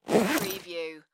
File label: Duffle Bag Zipper; Sound Effects Bible